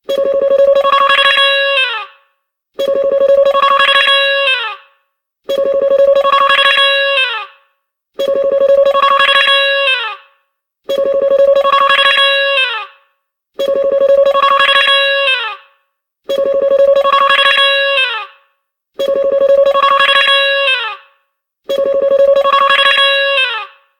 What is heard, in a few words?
Wah Wah, phonetones ringtones